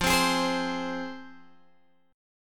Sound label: F Minor F down Guitar Open G